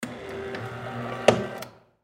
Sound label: Jail Lock, Jail Lock Mechanical Operate Click; Prison Door; Emergency